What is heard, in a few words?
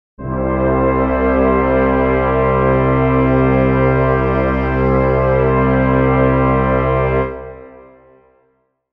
brass chord c major